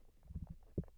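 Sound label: bufo underwater call and burp; soniferous; Gilligans Island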